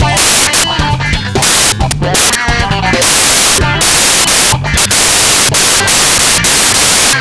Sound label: rock, bgm; pictcode